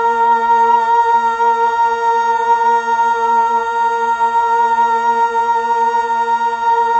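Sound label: Fem A sharp 2, Mellotron Female Choir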